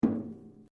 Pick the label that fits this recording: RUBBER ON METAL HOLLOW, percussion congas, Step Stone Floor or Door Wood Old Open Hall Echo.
RUBBER ON METAL HOLLOW